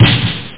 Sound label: drums snare; OctaMED SoundStudio V1